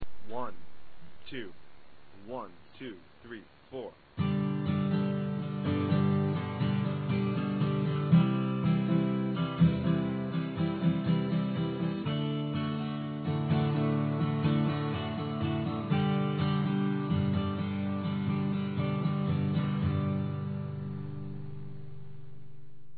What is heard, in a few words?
strum, guitar acoustic